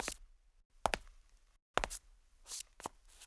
kroky; Mafie 1 cz

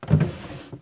krabice; Mafie 1

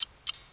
tik; Mafie 1

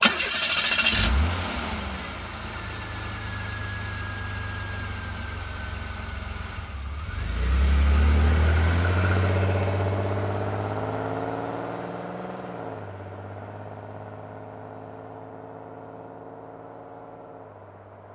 auto; Mafie 1

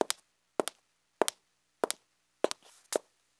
chuze; Mafie 1 cz

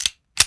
nabíjení; Mafie 1

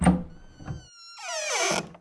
dvere otevreni; Mafie 1

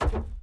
nasedání; Mafie 1

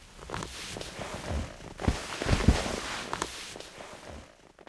do auta dosed; Mafie 1

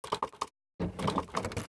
auto zamek; Mafie 1

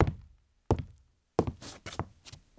chuze drevo; Mafie 1 cz

